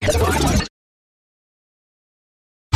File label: Quick Garble, Taylor Grand